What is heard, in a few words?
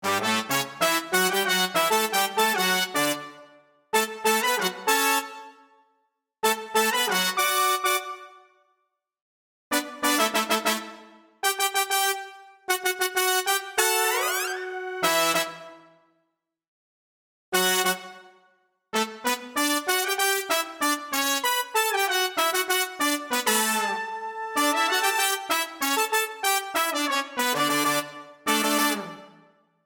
MODX Brass